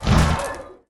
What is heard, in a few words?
Mask Face Light Up